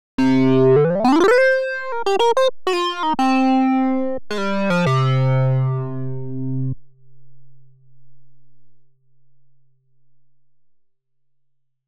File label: obx pure sync; opxpro3